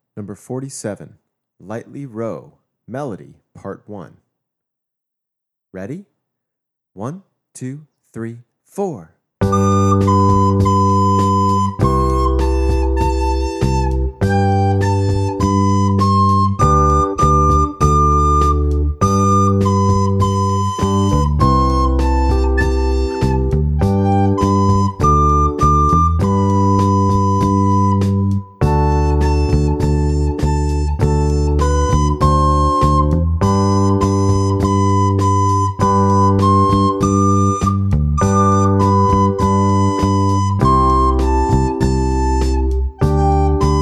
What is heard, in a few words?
Lightly Row Melody